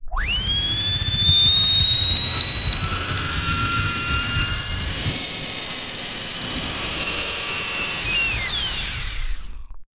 clean turbo spool sound

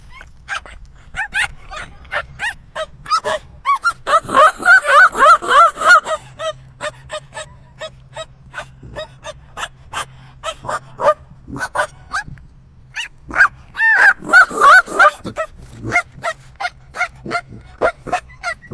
dog laughing and screamin

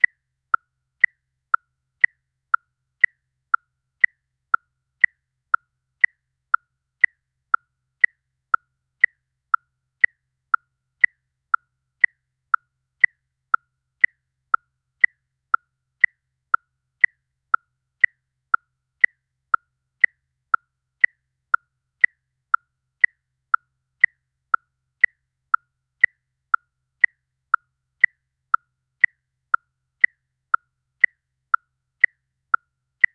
single dry woodblock; instrument

